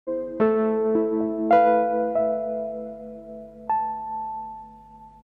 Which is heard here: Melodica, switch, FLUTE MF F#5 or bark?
Melodica